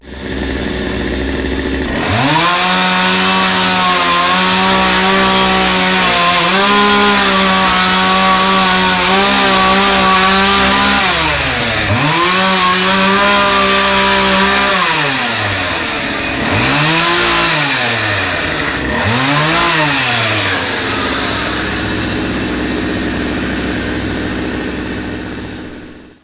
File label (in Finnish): moottorisaha